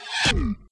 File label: start